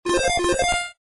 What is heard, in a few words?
Level Clear; Bit Dungeon Plus